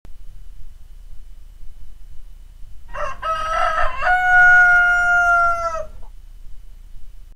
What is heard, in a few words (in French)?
sonnerie reveil chant du coq